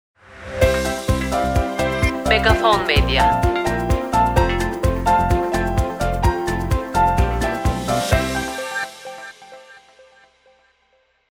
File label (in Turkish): TV HAVA DURUMU JENERIK; BENGUTURK